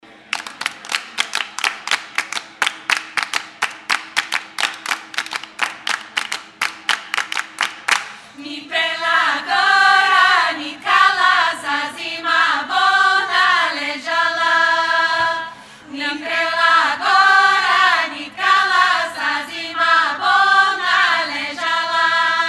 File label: Bulgarian Womens Choir; Ni Prela Gora; Live at 2018 Golden Festival